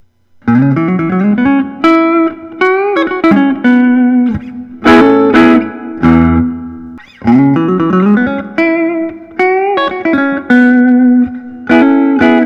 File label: gibson memphis 63 es 335tdc block